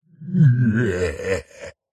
horror laugh male demonic wide heavily processed

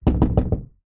household knocking door wood slight rattle

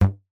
comedy bow arrow release

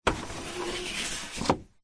vehicles car window wind up manual old; zapsplat

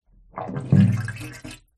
household bathroom water drain gurgle; zapsplat

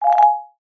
cartoon fast shake marimba

